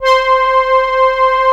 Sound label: SIEL, String Machines